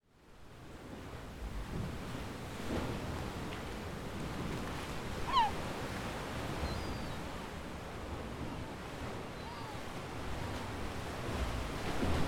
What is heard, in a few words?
birds clam; waterline